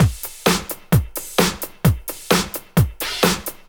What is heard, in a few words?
drt 130 electro, DRUMLOOPS, LOOP ELECTRO; Ueberschall; Techno Trance Essentials